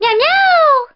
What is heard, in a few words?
meow; n64